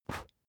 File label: match blow out